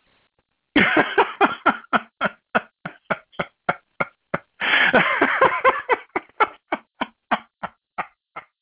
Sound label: heh